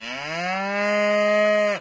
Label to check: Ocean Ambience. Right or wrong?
Wrong